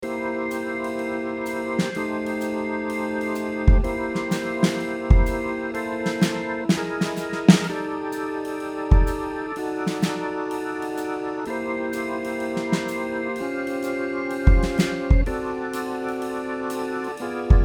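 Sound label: Tonewheel Organ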